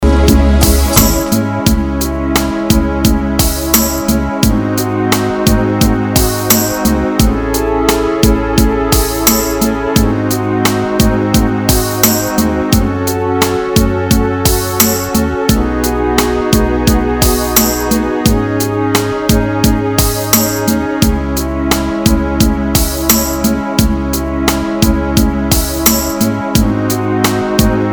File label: Karaoke Song